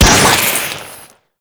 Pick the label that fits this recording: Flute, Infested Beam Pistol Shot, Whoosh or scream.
Infested Beam Pistol Shot